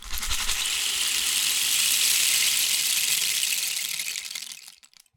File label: Rain Stick, Percussion Samples